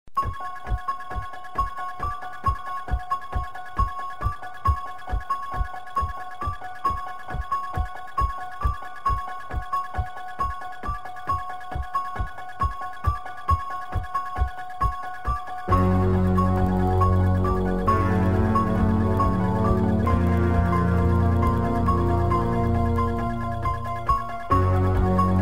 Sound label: dun dun dun; burgerking